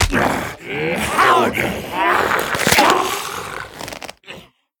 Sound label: Player Knockout; The Town; Kill Sequence